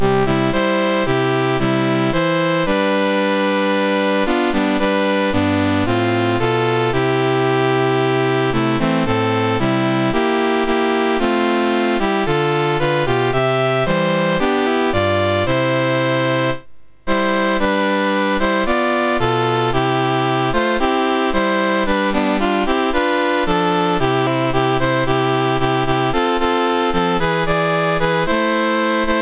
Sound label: Botswana National Anthems, MOS6581R3